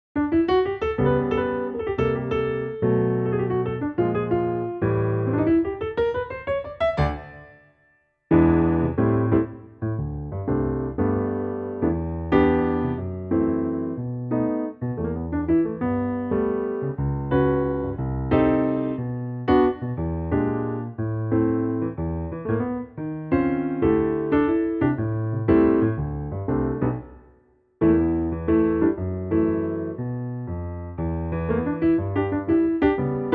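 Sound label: G Ab piano track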